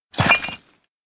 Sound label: shovel hit stone; anemoi